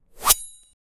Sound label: the warrior makes a sharp